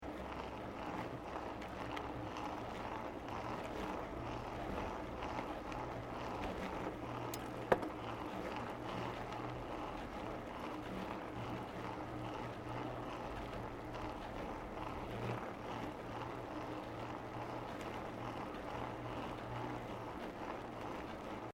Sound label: Bicycle Mountain Bike Road Peddelling Uphill